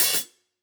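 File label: close Open Hat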